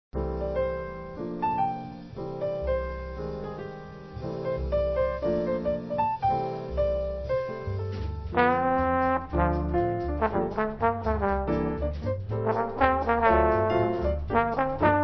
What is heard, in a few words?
Jazz Trombone Quartet